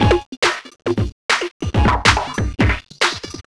Breakbeat, Drums & Percussion

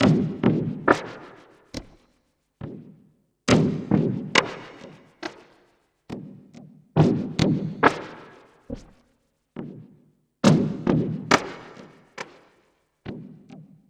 Electronic Perc; Drums & Percussion; Percussion Loops

